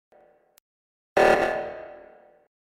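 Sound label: reactor meltdown sound effect; among us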